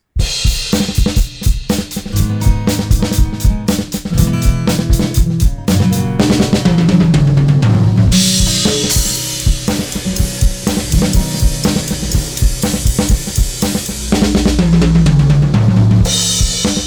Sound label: drum acoustic bypass; Neutron 4; Unmask